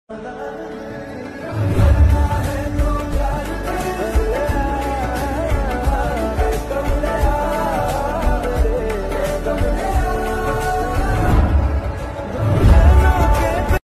hindi ringtone night mood

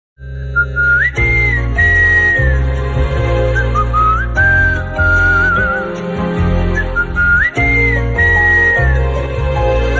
love bgm; goodnight movie

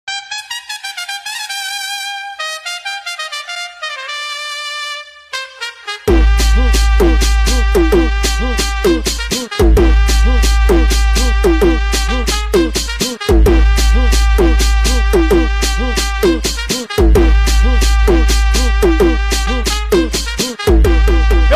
phonk ringtone

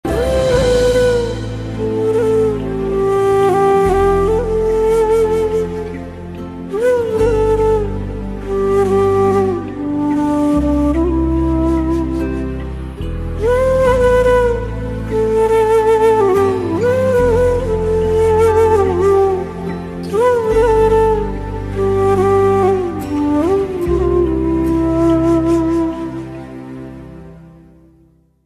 flute ringtone flute sad ringtone

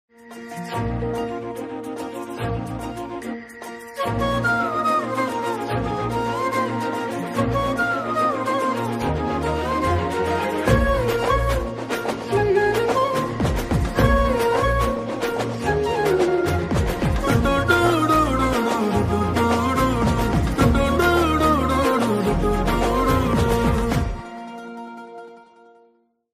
love bgm tamil bgm ringtone; lubber pandhu

